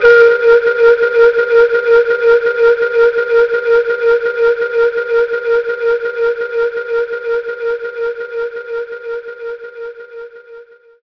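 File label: pan flute A#4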